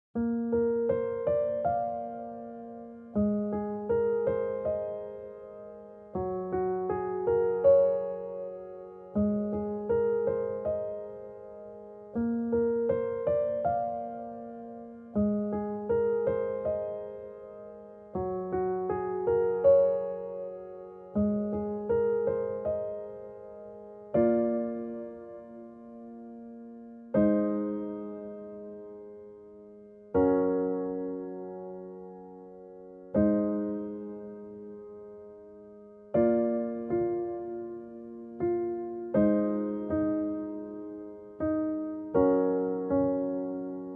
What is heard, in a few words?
piano; 감성 BG